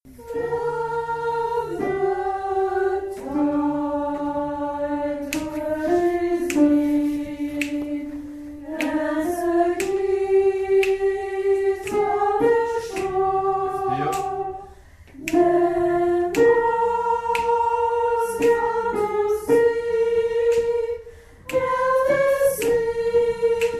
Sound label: Soprani